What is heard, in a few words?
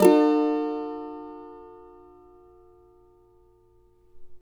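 E minor, Guitars; Spectrasonics; Hans Zimmer Guitars VOL-2; CAVA CHORDS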